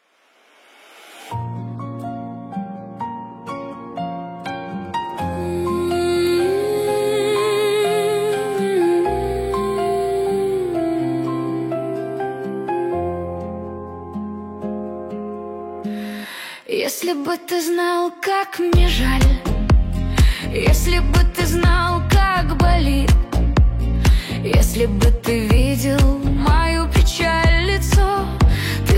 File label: ocean wave mix remix